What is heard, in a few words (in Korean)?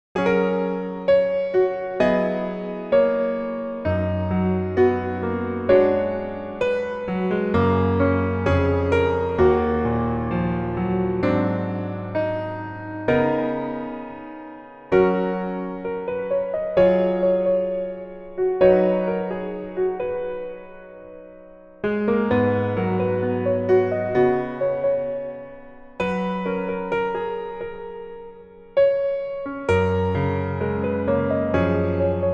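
Piano version 멜로디MR -1키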